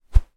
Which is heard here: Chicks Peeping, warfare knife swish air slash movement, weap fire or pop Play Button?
warfare knife swish air slash movement